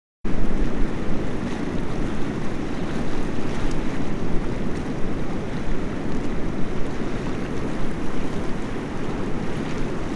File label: rushing water under bridge